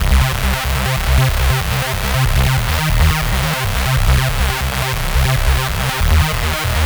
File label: Tech Lead; Звук синтезатора Техно-транс; Techno Trance; 140BPM; loop; Synth